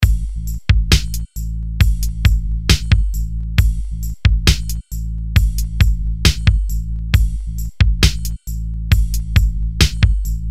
urban soundscapes

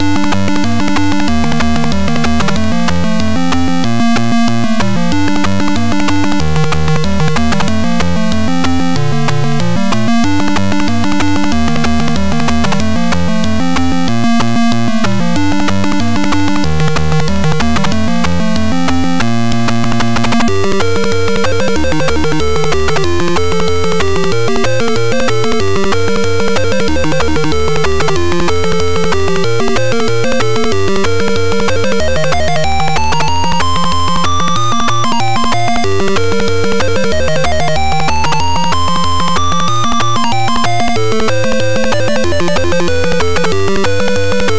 beeper; zx-music-beeper; keygen

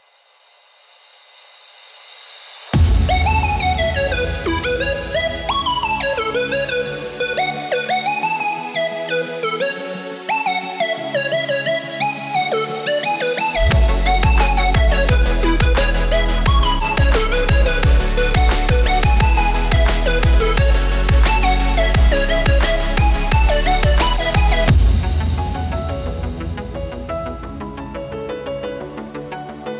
electronic noises

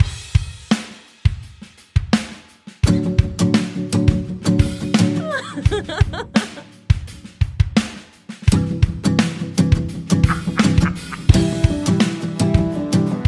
guitar ringtone

